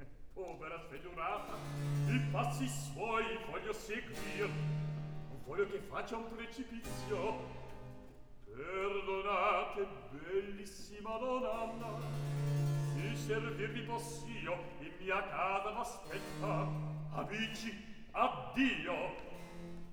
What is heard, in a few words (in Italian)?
Recitativo; Act I; Povere sventurata!; Mozart; Operas